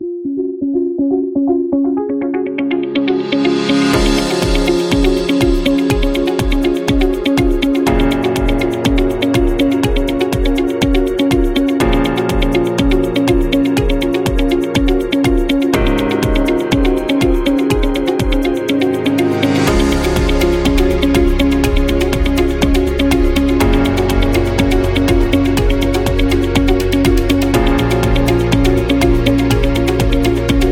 Background Corporate

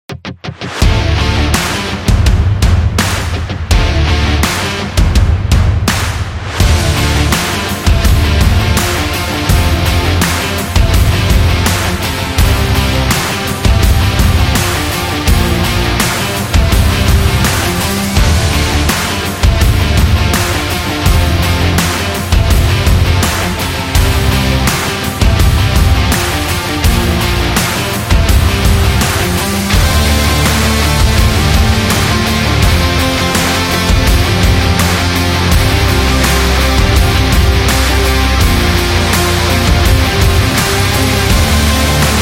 Indie Rock Energy

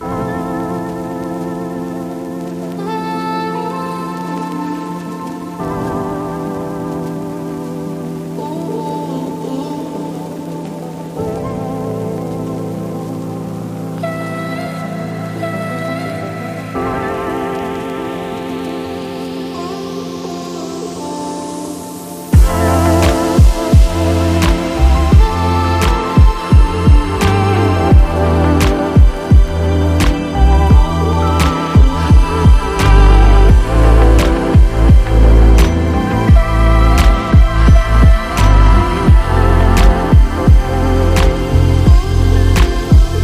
Premium Background

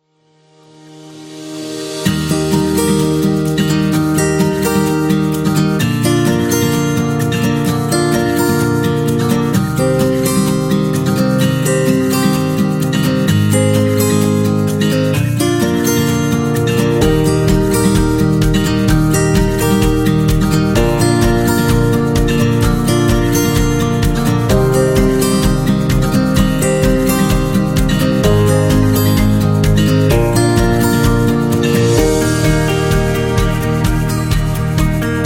Wedding Guitar

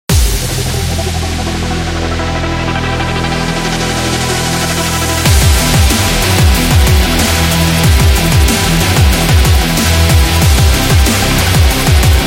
Dubstep Rock Power

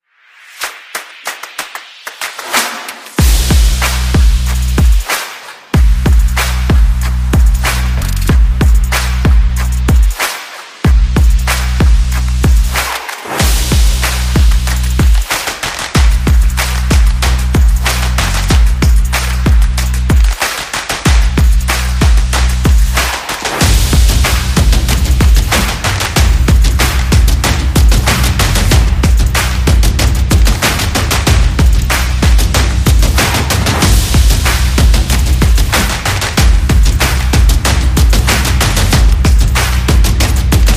Hit It Hard

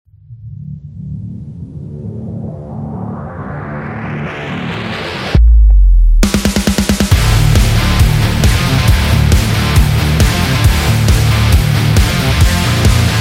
Racing

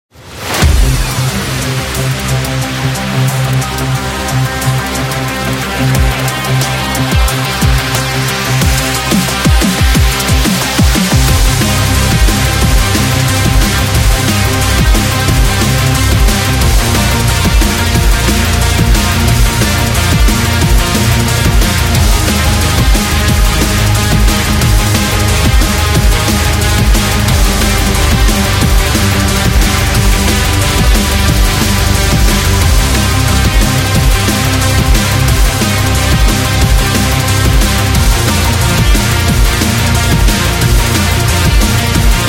Energetic

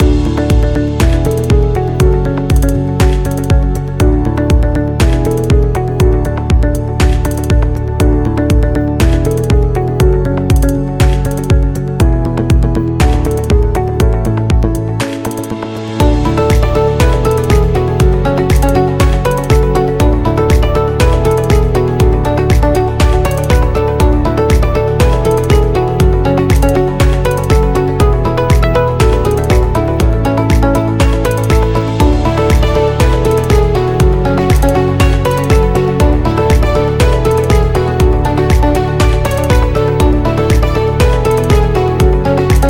Business Loop Corporate; explainer

